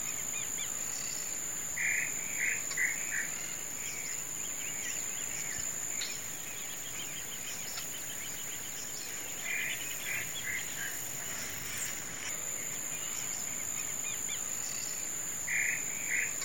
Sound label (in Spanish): tataupa comun